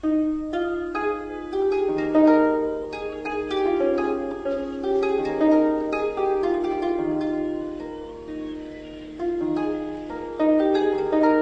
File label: harp